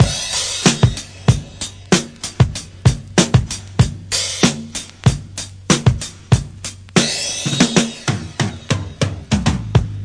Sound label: classic break, DRUM LOOPS and BREAKS